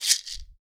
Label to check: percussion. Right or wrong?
Right